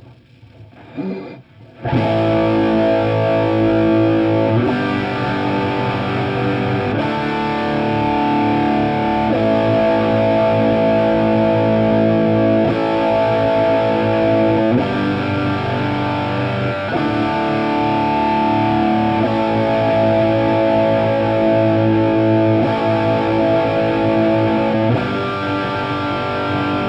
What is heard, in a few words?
Guitar